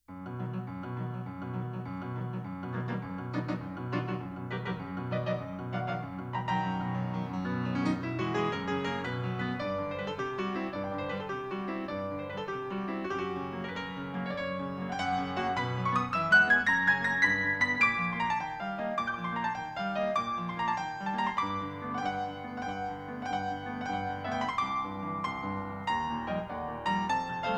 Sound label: neurotic piano